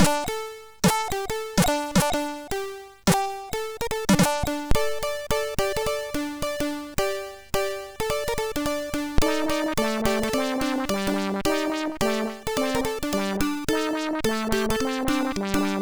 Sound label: sid MOS6581R3; Running from a Ghost